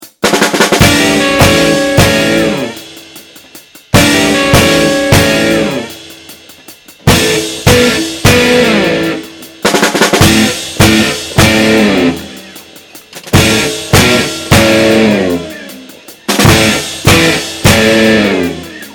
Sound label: Drum Go